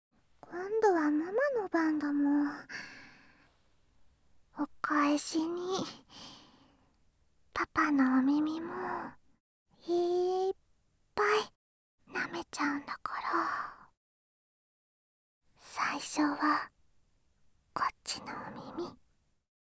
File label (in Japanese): 耳舐め（右側）